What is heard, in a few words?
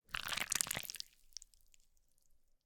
gore, flesh guts gore movements wet; Timothy McHugh; Gorification [HD]